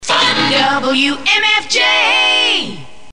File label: Accapella